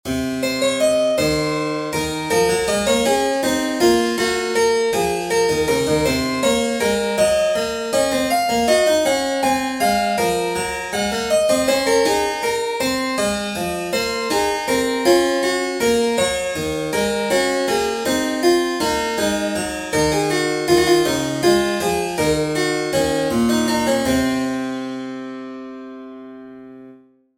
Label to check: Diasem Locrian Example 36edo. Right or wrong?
Right